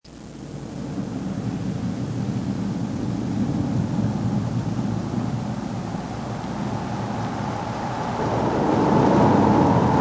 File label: Wind & Thunder